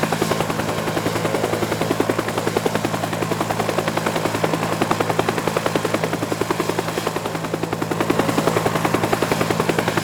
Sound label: chopper loop; teardown; ps5 windows xbox series xs